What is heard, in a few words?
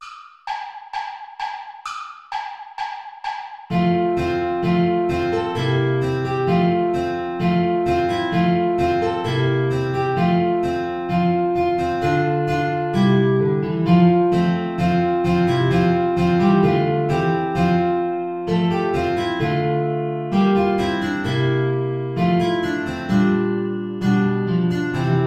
play along without trumpet; traditional angels have heard high